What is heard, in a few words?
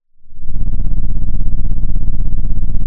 Sound of an engine revving